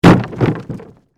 Crash Wood Debris, Crash, Wood, Debris, Drop, On, Wood; Heavy; Materials